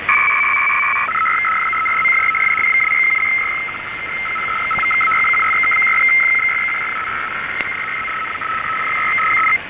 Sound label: 150 Bd 800 Hz MEROD and tone squelch; Sandbox:RAC-ARQ